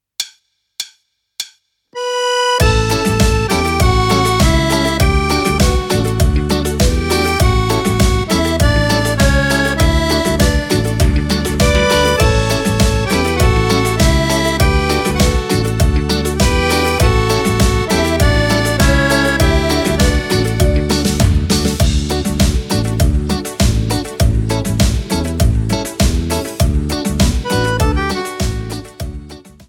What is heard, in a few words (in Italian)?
cumbia, fisarmonica